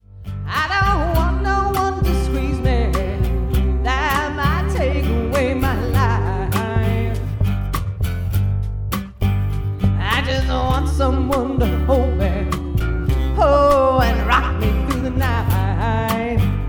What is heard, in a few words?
acoustic snippet